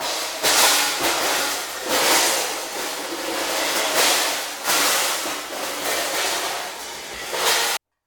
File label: Crashes Mix